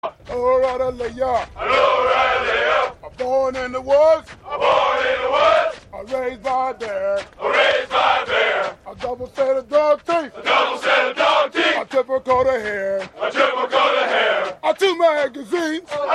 military running cadence, born in the woods raised by a bear